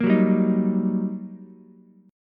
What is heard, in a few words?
G Minor Major 7th Sharp 5th, down, Ukulele; GmM7#5 strummed; JGuitar